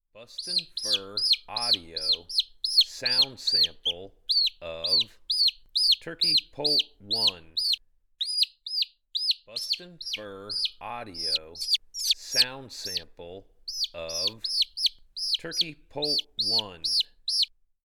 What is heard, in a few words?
Turkey Poult